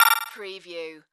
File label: Multimedia Alert